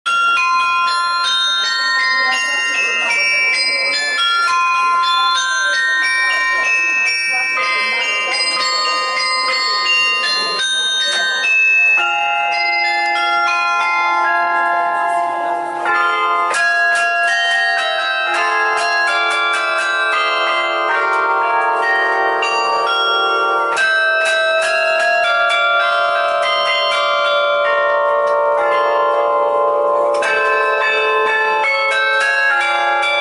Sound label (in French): Carillon de Forcalquier